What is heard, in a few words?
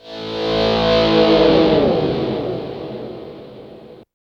GTR, E.Guitar FX; Roland LCDP02 Guitar and Bass